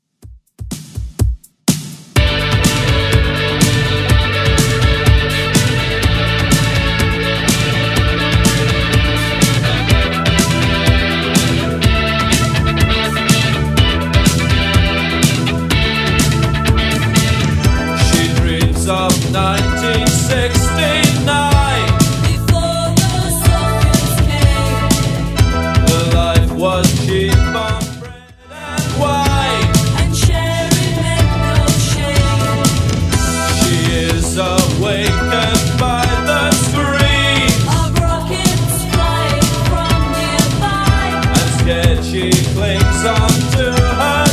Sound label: 80s Redrum 124bpm